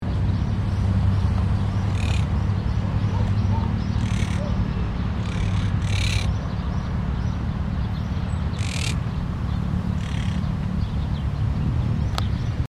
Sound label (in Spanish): Cotorra